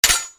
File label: attack pre launch; Li Li Shadowpaw Sound Effects; Li Li Heroes of the Storm Responses; warcraft